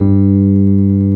SUIT F4 G1, suitcase; Jazzistic

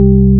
BASS FM, SYN BS; AKAI S6000 CD-ROM - Volume 1; SAMPLE WAVE MIXING 2; SYN BS #3

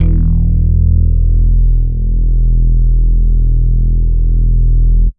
Synth Bass; Bass X

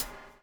BUBBLE; Spectrasonics - Backbeat

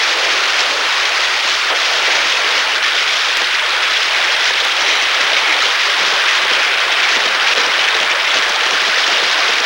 UR FX water; Sonic Foundry (Sony Creative Software); Ugly Remnants VOL-2; UR 2 FX (Long)

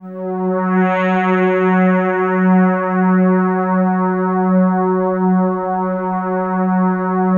DENSE FL, PADS; Masterbits; Vintage Voltage

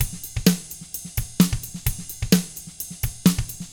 HRDRK, hard rock drums

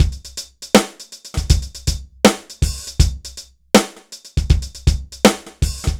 dry Loop BPM 80 Dry, AKAI S6000

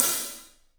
Paiste hat, drums; 3D Sound

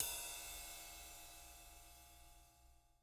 JZ RIDES, Living Drums; Sampleheads; Peter Erskine

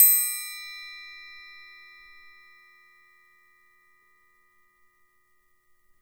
TRILONG, Percussion; Sampleheads; NYC Percussionworks; 90 s Sample CDs

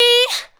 ADLIBS 90; Ueberschall; Jam Box

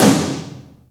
TOM; Big Fish Audio; Synth City

